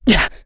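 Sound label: Vox Landing, English; Kasel; kingsraid; voices heroes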